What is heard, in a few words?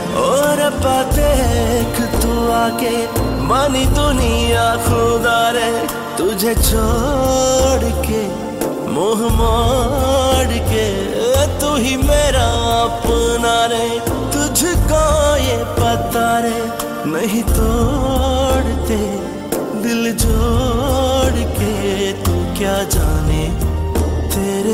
Sad Ringtone